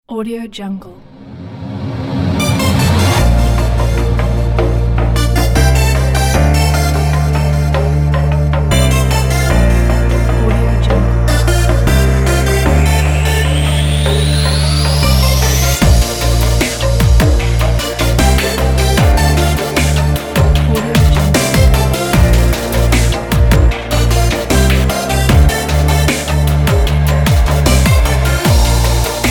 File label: uplifting future bass